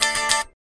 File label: Guitar Strum; Dog's Life